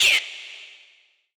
vox

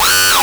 bass

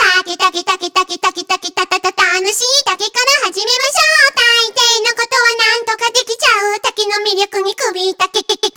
Vo Main

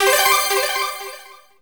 CHARA RESULT GAUGE TWINKLE; maimai

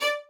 violin

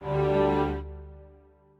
strings

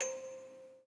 musicbox

